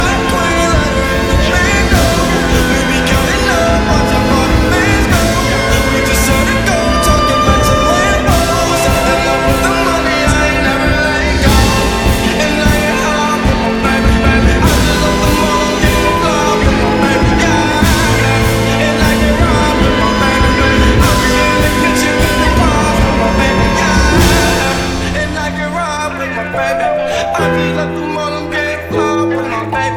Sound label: Trap